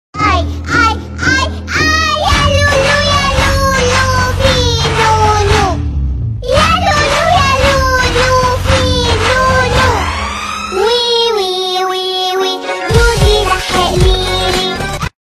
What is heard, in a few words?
ya lulul ya lululu aai aai aai viral ringtone cute girl voice